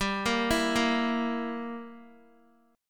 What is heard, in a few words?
G Minor 6th, Guitar; Listen to Gm6 arpeggiated